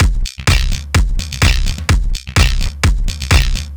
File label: 127 bpm full, drum loops; Zero-G; Soundclash Nu Dance Electro; Acidized Wavs; Leapfrog